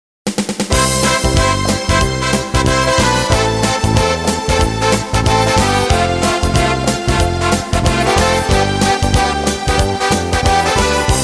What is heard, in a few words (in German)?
Schlager Medley